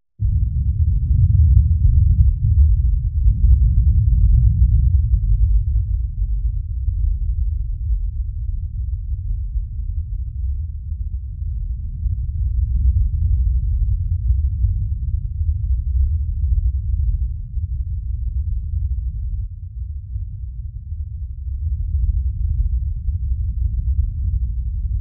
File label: Explosion, 5.1 Surround